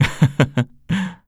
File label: laugh; Eternal Return; KOR